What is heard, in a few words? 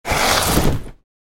Tiger Die